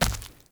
Walk Default, Footsteps; Avakot; Friendly

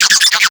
Infested Minion Bark, Vocals; Warframe

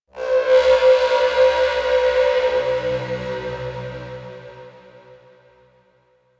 Crp Ship Energy B Texture Large; Ambience; Warframe; Corpus Ship Remaster

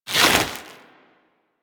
Sword And Whip Pull Back; Warframe

